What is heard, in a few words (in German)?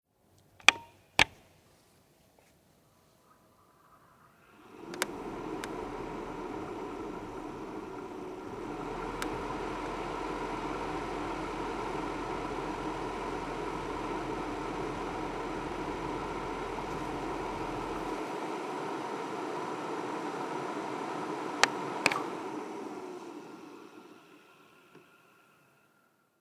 Heizlüfter Dyson Hot AM04 an aus